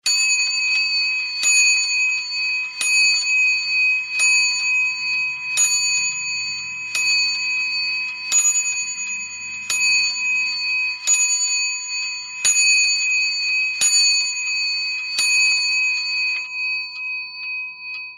CLOCKS BELL: INT: Clock bell strikes 12 0`clock, high pitched bell.